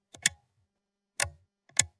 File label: toggle switch sound